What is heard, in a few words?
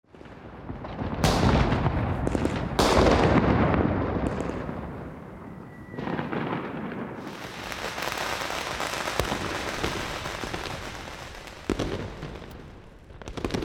fireworks; Fireworks2